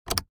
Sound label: Take Smoothie